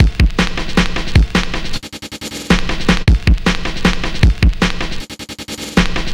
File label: Old School Break, Drums